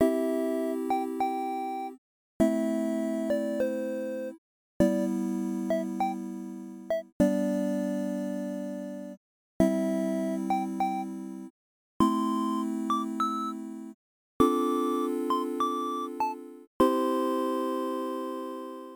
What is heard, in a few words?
Song Chime; Metropolismania; Gameboy